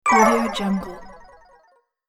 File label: player fails